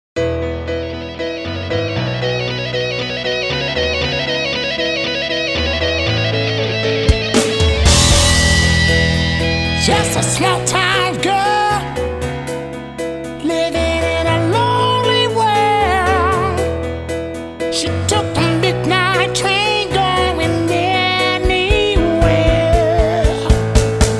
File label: Variety Band, Classic Rock Medley; Washington DC Variety Band